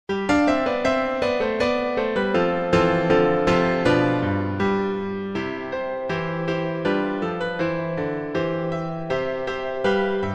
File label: Piano Allegretto